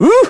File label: ice slip; Crypt of the NecroDancer